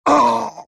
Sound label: axe pain; dota